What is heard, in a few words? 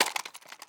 deploy grab forearm; Rust